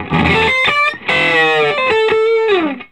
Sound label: Vintage Blues Guitar, SOLO